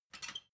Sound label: ladder metal handling short